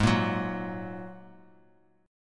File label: G sharp 7th Suspended 2nd G sharp; G#7sus2; strummed; x,11,8,11; JGuitar